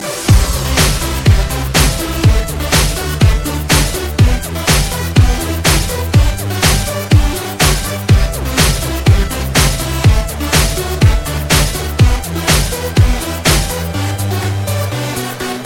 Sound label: fire song, hip hop ringtone